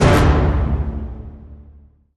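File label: Hit Orchestra Tutti D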